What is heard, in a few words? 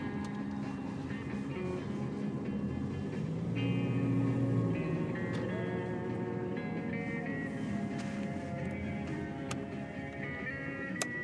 taxi muzak